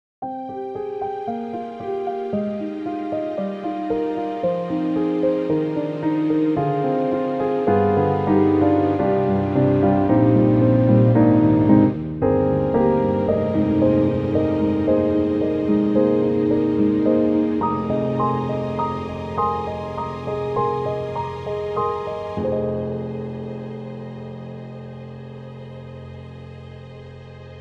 Piano Concert; Steinberg HALion 7; Verve

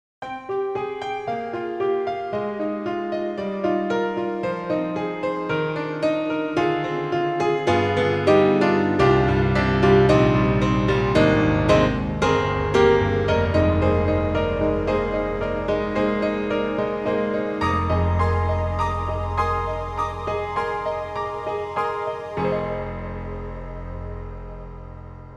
Grand Pianos, Studio 7ft, Pad and Studio Grand; Synthogy; IvoryII

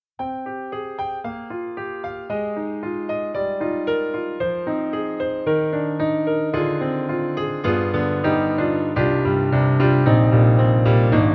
German Grand Mix Mic; VI Labs; True Keys

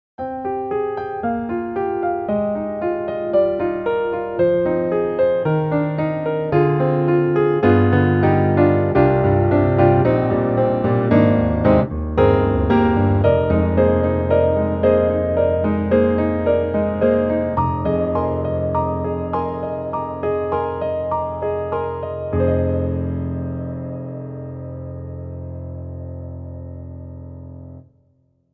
Custom C7 Softest; Spectrasonics; Keyscape

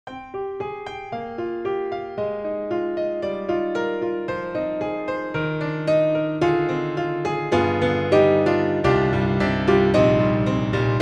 Grand Pianos Studio 7ft Resonant Jazz C7; Synthogy; IvoryII

